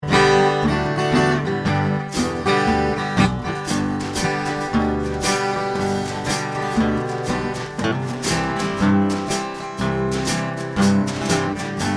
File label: soundcheck